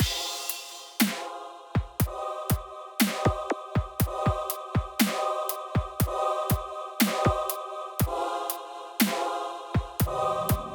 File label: Dubstep Mix